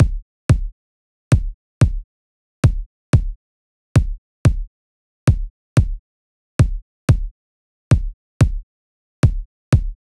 Kick 91

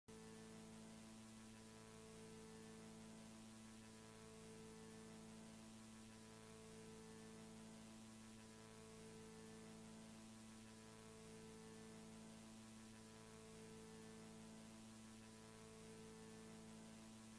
VHS Tape effect with sound

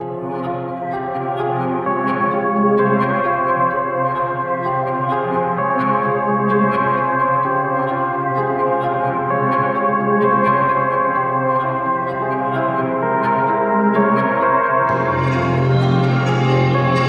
129 song starter loop dark rev Fmin; MOGL

